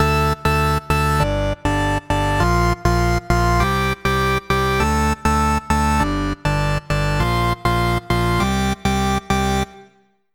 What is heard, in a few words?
Synth Seventh Keys